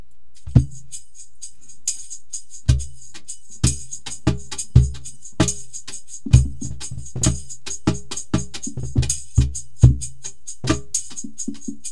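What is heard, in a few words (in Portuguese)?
percusson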